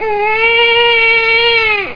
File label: baby cry